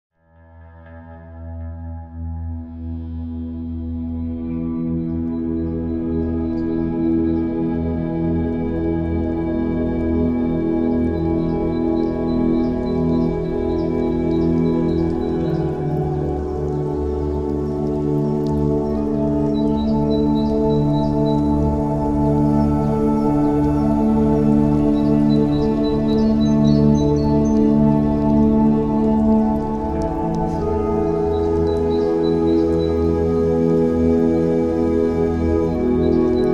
Binaural waves

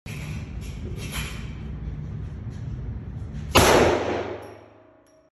Desert Eagle; اسلحه دزرت ایگل

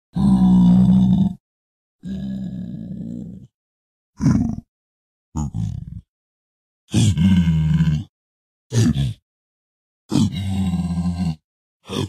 Pigman Minecraft; پیگمن در ماینکرافت

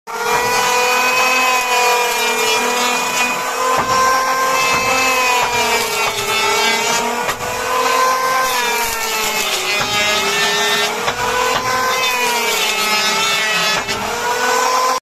sawmill; کارخانه چوب بری

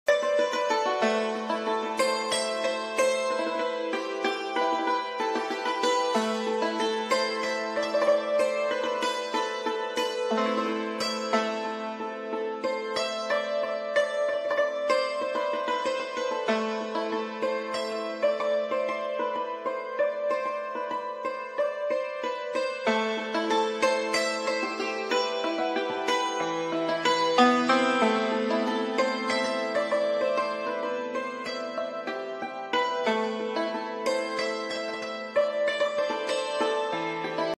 Shahtoor

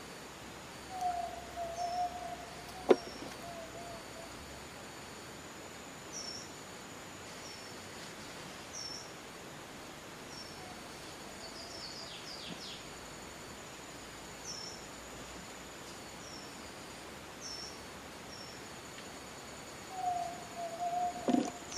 Yellow legged tinamou

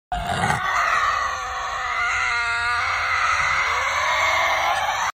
tasmanian devil; شیطان تاسمانی

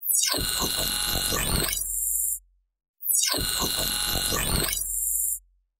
Laser scanning

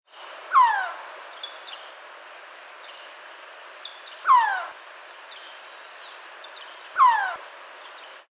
Resplendent quetzal

